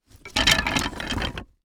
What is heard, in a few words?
Foley Wood Pieces Cardboard Box Wood Rattles